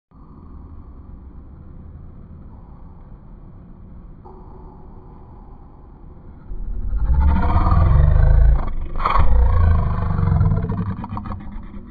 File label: growls